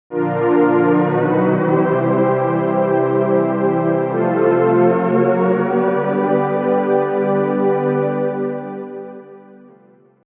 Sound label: pichbend